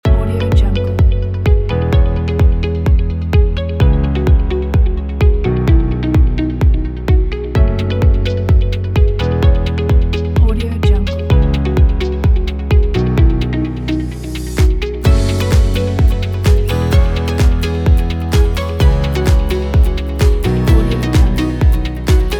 tech upbeat inspiring corporate

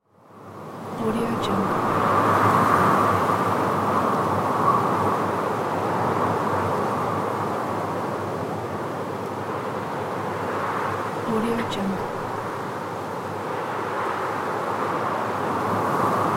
wind

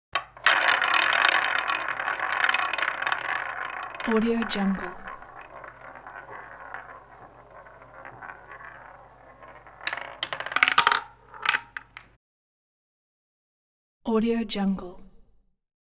roulette wheel spin with ball drop

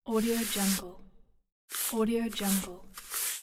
brushing hair

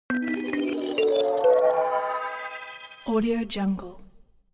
ui notification kalimba magical sequence ascend

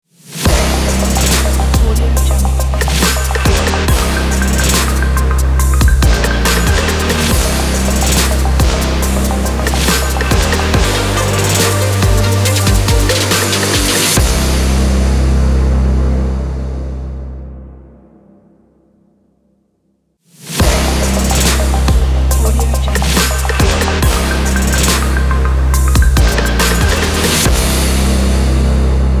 powerful trap ident

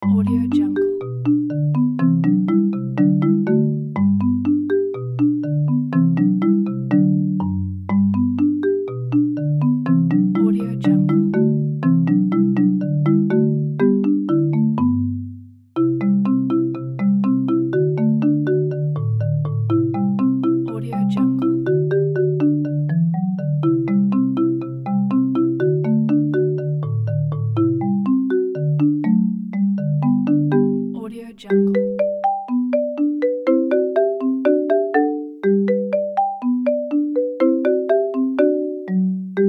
marimba limbo

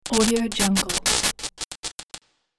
neon sign flickering

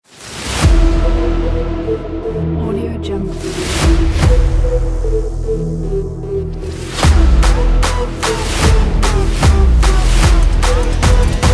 hip hop epic pack; audioknap

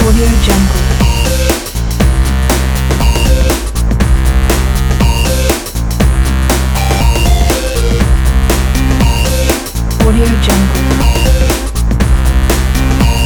breakbeat loop

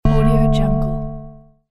wrong answer sound

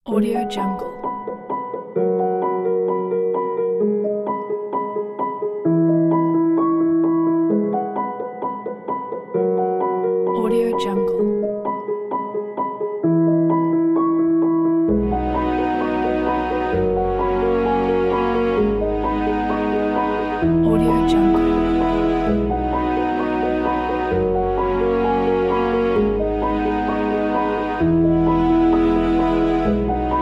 sentimental piano

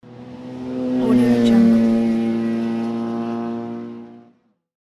race car pass by; alexm76

